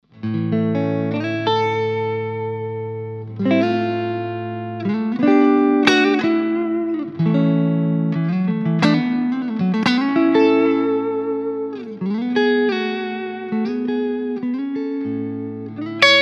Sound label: jazzy